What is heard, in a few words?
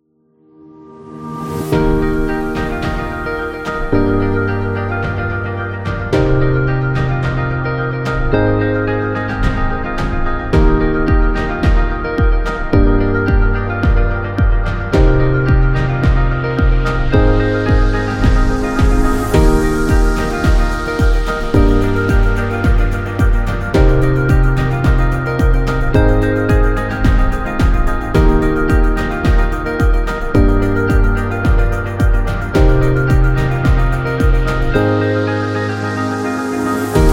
Positive Sound